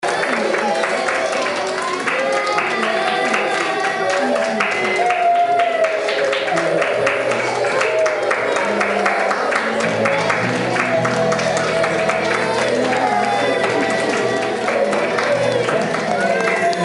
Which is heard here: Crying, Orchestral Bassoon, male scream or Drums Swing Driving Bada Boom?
Crying